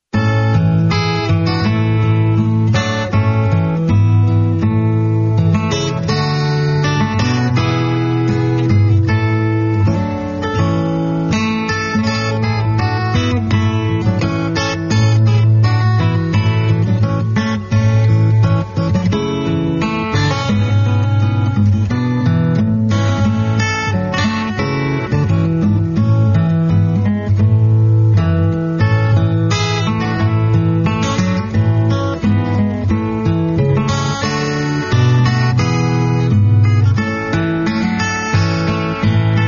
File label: Joy To The World (Guitar Instrumental)